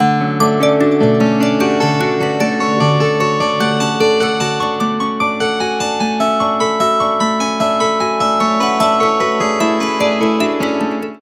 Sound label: Corning Star, ringtones